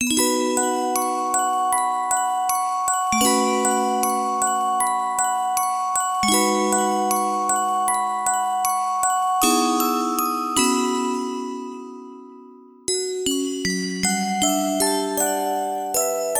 whisper moon (Musicbox version)

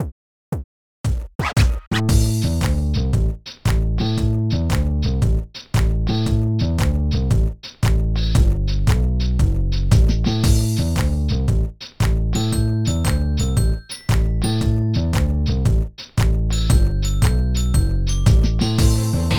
BPM115; Scribblenauts

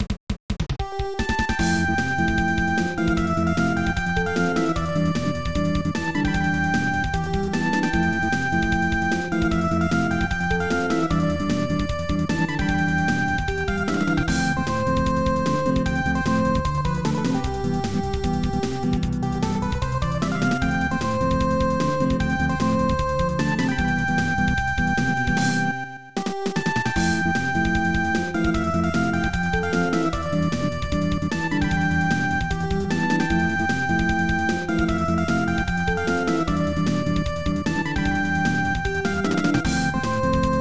High Score!; Kablooey; SNES

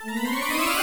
Loop; mega man x4 1997 saturn gamerip; Frost Walrus Stage A

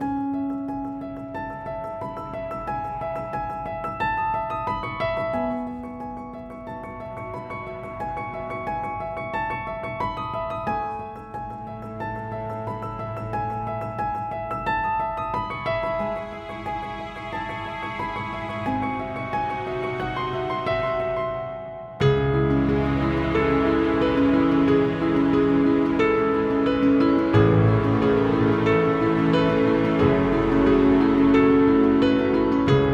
Happy Piano Track; Fault series

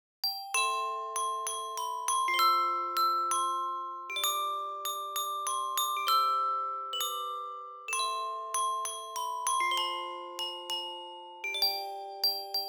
Music Box; Dragon Fin Soup

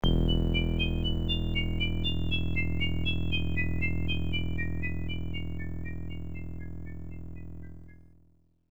Wall Vanishes; Eye of the Beholder II: The Legend of Darkmoon; IBM PC AT

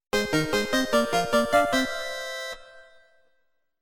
Leveling Up Sound; Kaijuu Densetsu The Tale of the Shell Monsters

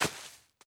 walk grass

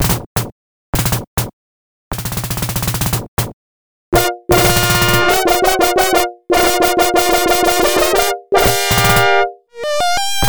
Fox Fanfare; tick; genesis

